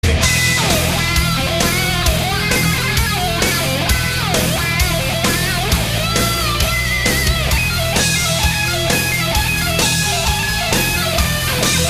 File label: Solo